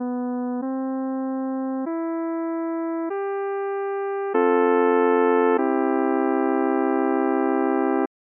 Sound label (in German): akkorde